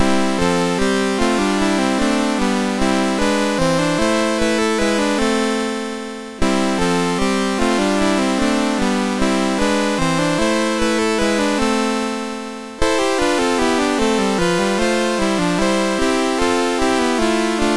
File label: sid MOS6581R3